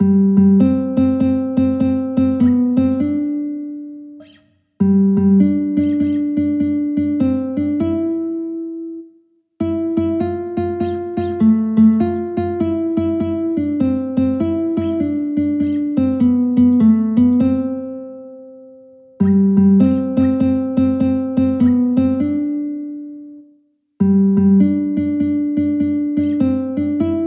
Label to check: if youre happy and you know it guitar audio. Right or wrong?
Right